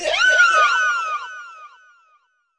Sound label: weird echo vox hit; Various Strange Sounds and Effects